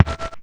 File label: Perc E Noise Drum Hard Electro; pitchglitch